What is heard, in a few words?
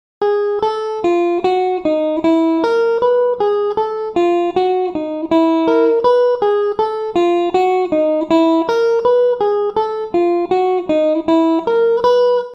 spider pattern, guitar; spidertech